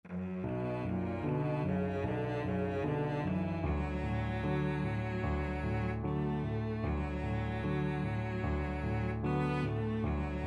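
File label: violoncello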